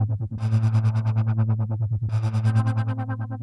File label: SPACE SYNTH 140 A; SpaceSounds